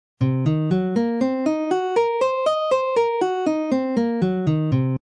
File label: c minor seventh flat five arpeggio, guitar